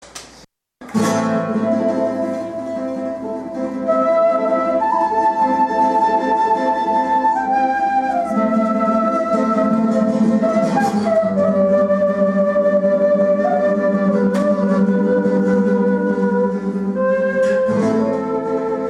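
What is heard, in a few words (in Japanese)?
ケーナ; 小学校・音楽鑑賞コンサート; アンデス山脈; 貝塚市葛城小学校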